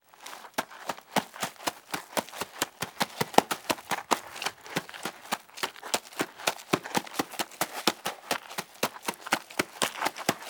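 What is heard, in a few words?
Footsteps run dirt; Fairlight Sound Lib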